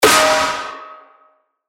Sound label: percussion; Bounce; revealed percussion vol 2